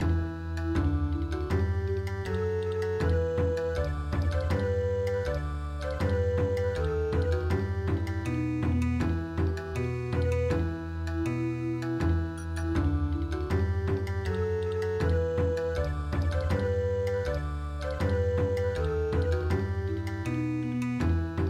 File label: Bon Odori, midi